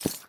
crystal run, step; blocks

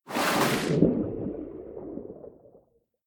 enter, underwater; ambient; Minecraft

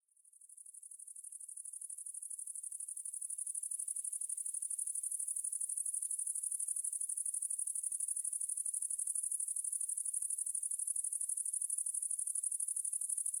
cricket

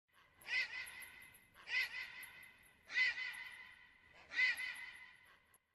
vulture; ambient; prey

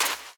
sand run, step; Minecraft; Remastered

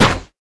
bow loose; items; Minecraft; Remastered

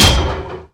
hit, iron golem; mob; Minecraft

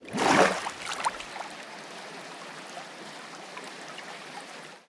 exit, underwater

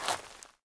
gravel wander, step

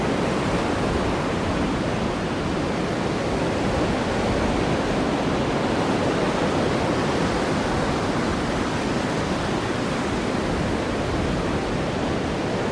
elytra loop; Minecraft

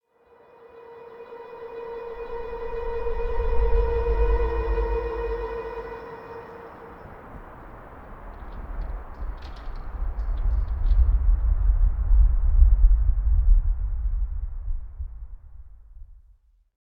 cave, ambient; minecraft

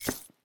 crystal walk; blocks; step

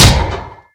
hit, iron golem

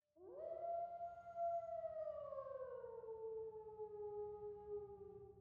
wolf

